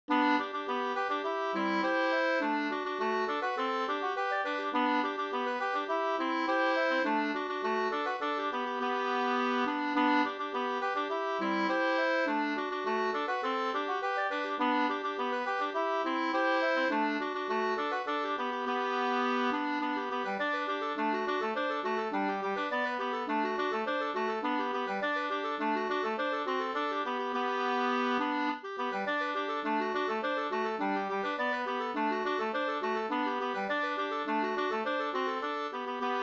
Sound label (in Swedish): polka polkett; fr Dannemora e C o H Wallin 2st